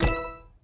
tile cascade match; sweet shuffle

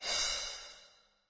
menu close; freecell solitaire

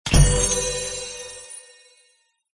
Powerup Party Bomb Explosion; word wipe

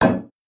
tile illegal Swap; sweet shuffle

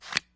deal card; freecell solitaire

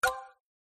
Tile Pop; word wipe

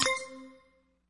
click start; freecell solitaire

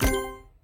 tile cascade match; sweet shuffle

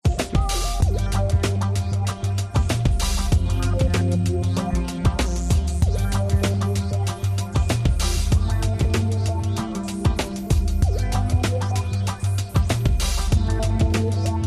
bg music; scramble words

